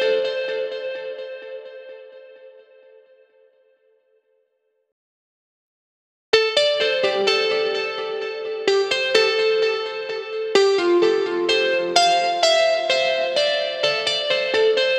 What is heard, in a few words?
128BPM Melody; Vengeance Samples; VFH1 128BPM The Classic Kit